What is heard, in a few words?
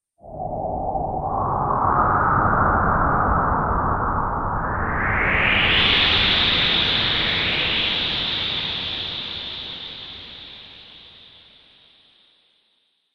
Astral Winds, Science Fiction Sound Effects